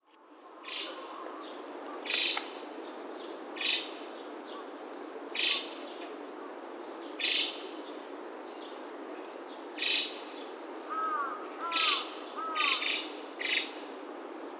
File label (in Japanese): ムクドリ; さいたま市